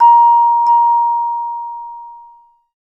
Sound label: Tuning Fork, notifications; LG G6